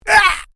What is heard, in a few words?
MALE VOCALIZATION ARGH; Male vocal sounds; Exclamations; Voice